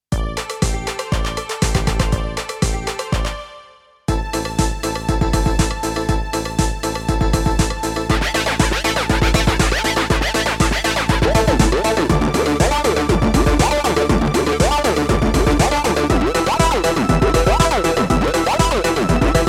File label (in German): 16 Sequenzer plus Arpeggiator